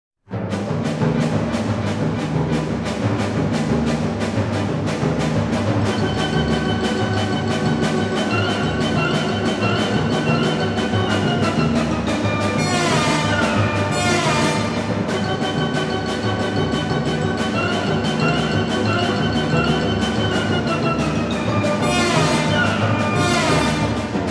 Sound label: percussion together